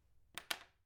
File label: Put Down The Pen